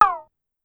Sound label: Cowbell CR Down Fast, Pitch Env; Clavia DDrum-AT; Pitch Env Various